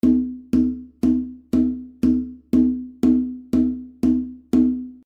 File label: Conga Set Front